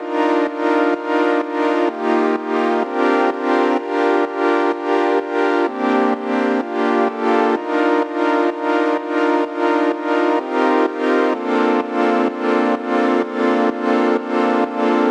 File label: Serum KY Synth